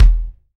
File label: small reverb kick one shot e key; Duration 0:01; Channels Mono; kick drum samples